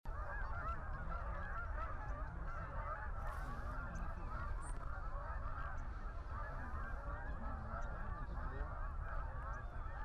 Virginias Warbler Chipping loud geese; Talbot MD

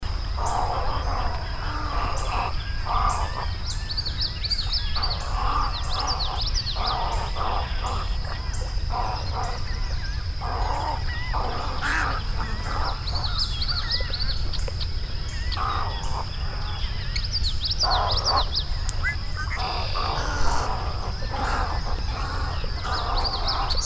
Snowy Egret; Worcester MD

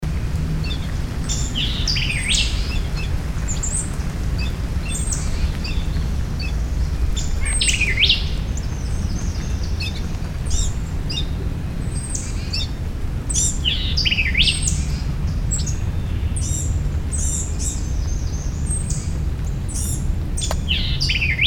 White-eyed Vireo; ACLT

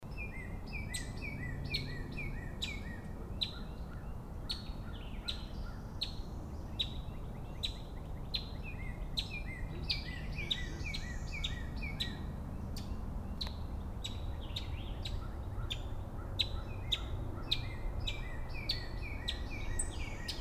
Kentucky Warbler; Montgomery MD

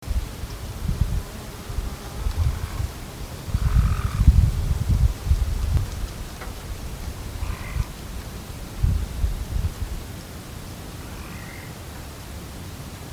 Virginia Rail Unusual Calls

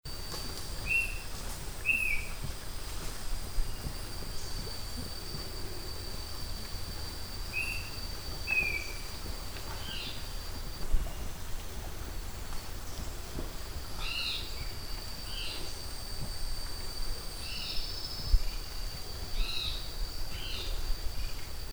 Chestnut-backed Antbird, Panama; Burbayar Panama

